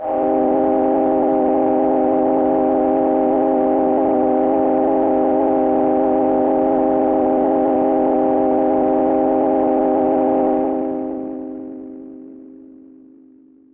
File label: PAD